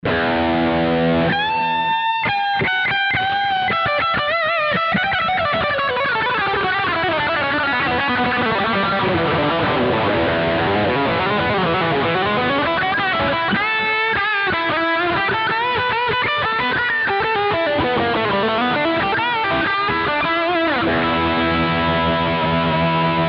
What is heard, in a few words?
GT-10 Plexi Lead